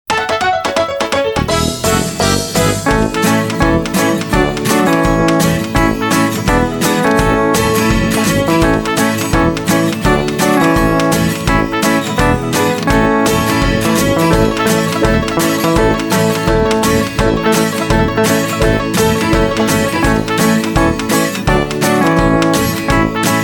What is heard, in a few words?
Karaoke